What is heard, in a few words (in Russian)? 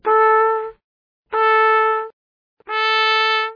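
труба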